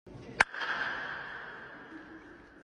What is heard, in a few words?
perfect dap up